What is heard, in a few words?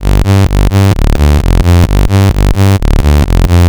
Synth, 130bpm, Nu Dance Electro; Zero-G; Soundclash; Combi Loops; After Eights